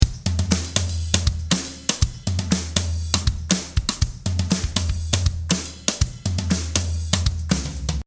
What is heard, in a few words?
Drum Wet